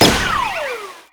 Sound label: Confetti; The Princess and the Frog; wii windows